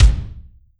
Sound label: Yamaha RM50 Rock Kick